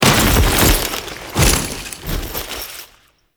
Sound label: Foley Moa Finisher; Warframe; Kahl